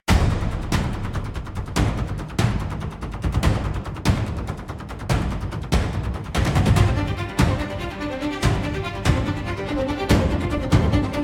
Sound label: Thunder Bridge Run, cinematic Japanese style battles